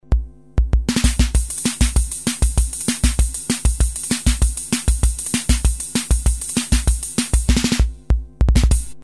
kr55

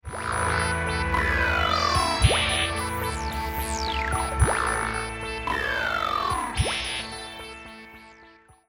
ems synthi aks; Demo Excerpt (Vintage Synths)

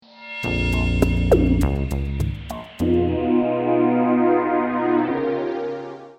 roland jx8p

